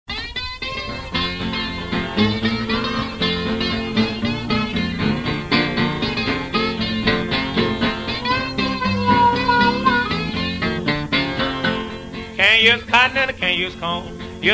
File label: Slide Guitar